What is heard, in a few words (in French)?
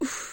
melodie; code game jam drop plafond 2025